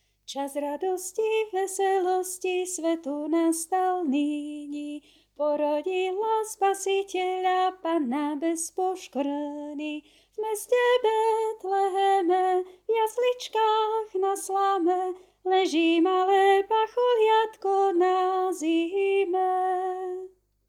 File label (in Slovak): Sopran